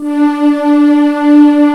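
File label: synth string; jazz jackrabbit 2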